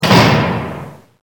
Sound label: cell shut